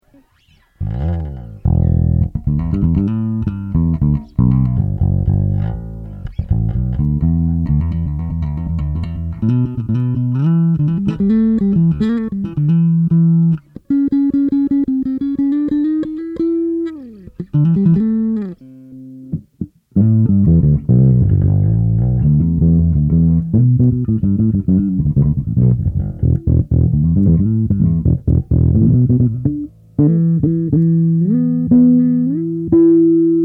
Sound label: VINT JB BASS; electronics